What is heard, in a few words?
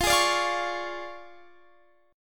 D Minor Major 7th Flat 5th, F